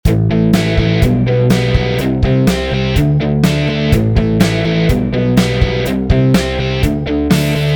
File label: power chord 6712